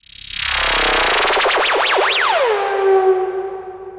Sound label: fx; ringtones; Google; NewWave Labs